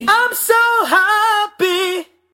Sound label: Happy Msg Tone